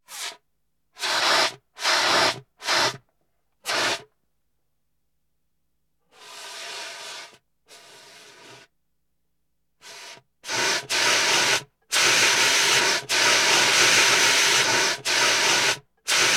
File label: Fire Extinguishers; KMP Film Video Sound Effects